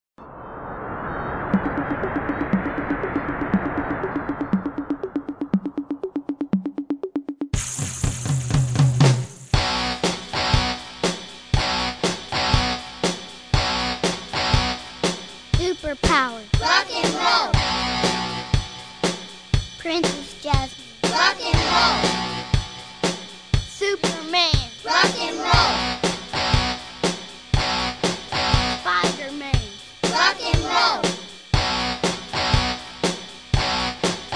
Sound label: Rock and Roll